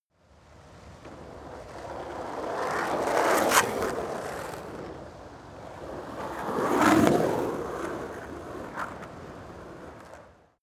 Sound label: skateboard double drive by